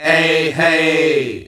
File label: EHEY E, MALES; Best Service; Hyper Dance